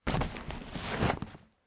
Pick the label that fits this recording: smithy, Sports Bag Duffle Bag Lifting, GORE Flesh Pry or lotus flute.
Sports Bag Duffle Bag Lifting